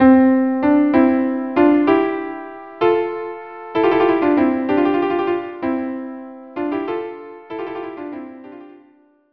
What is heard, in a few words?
viola duo viola; pifa